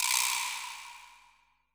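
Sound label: VIBRASLA, drum machines; JV-1080 DRMS; EdgeSounds; Drum Mashines VOL-1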